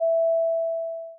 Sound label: notification